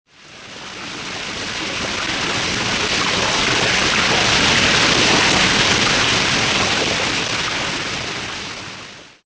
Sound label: water; Soldier Of Fortune II Double Helix; XBOX